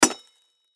Impact Metal Rod Hit Windshield Crackle; Sound Effects:Materials; Blastwave

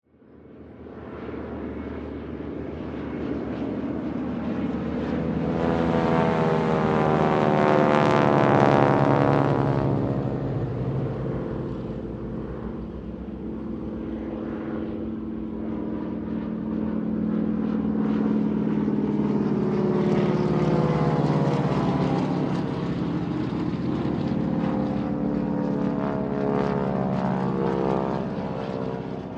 World War II Planes, Warfare, Planes, Pass, By; Bombs (Sound Effects:Explosions); BlastWave

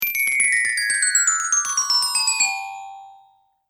Chimes High To Low Slow, Windchime

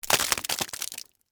Splat Debris Juicy, Long; Texture; Splat Sound Effects; Splats (Sound Effects:Objects)

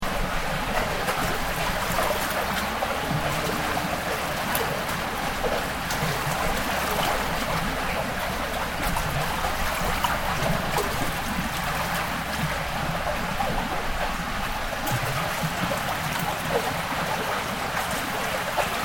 Water Storm Drain Heavy Flow Waves Loop; Elemental weather effects; Water Sound Effects; Nature

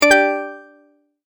multimedia tone start

